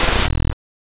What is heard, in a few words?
Glitch Sound